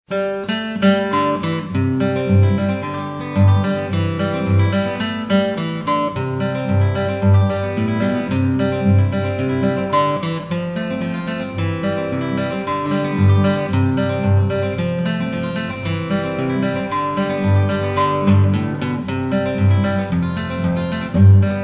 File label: Instrumental